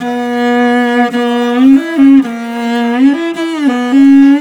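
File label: VIOLI LP, MORIN violins, Asian Traditions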